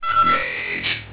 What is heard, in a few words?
blip; VitalWatch; mage